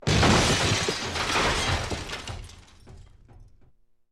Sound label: fire blast; soundscrate